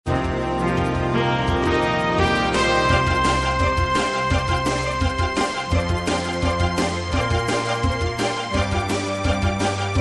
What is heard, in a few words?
Business to Business, corporate music; generic corporate music